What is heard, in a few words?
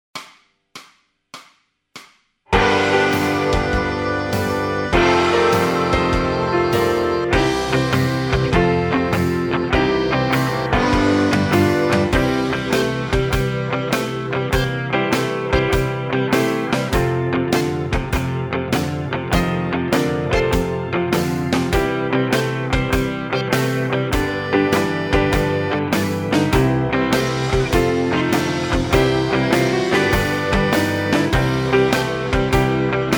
bass blues, backing tracks